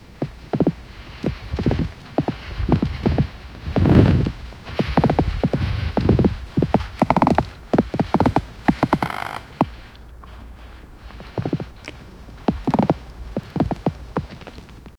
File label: wind steady cold gusts, outdoors